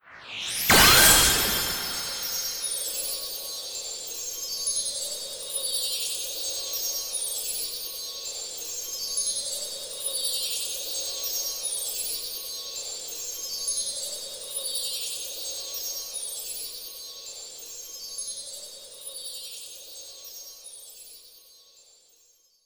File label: Gacha Intro Rainbow Loop; Gacha Intro Dp Rainbow Loop